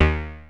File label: OB D#3 -R, Rare Synthesizer; Hollywood Edge - Giorgio Moroder Rare Synthesizer Collection; OB 4 VOX.